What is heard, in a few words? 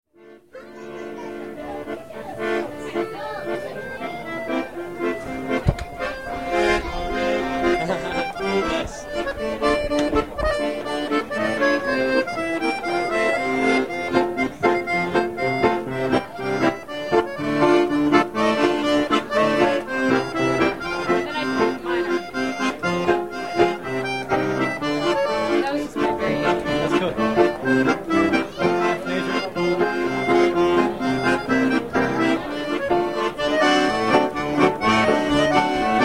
klezmer